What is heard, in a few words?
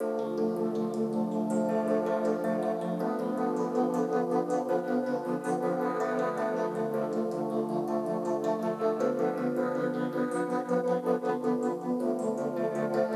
Pads 160